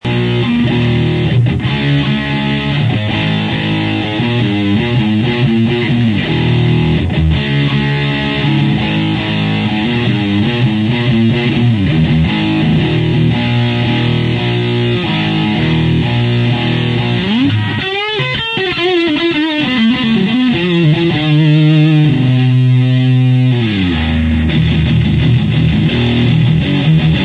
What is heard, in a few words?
new amp full od